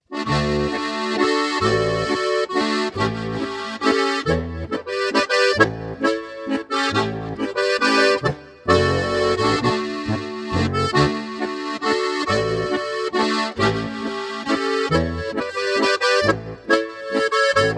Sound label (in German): 2 Chörig Flach Ruhig ADGC